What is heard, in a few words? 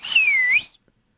human whistle